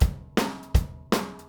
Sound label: 160 Country Shuffle Drums, Very Fast Tempo; Pop Rock; Pro Tools Essential Loops; Digidesign